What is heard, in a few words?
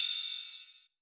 Cymbals Ride; Vengeance Samples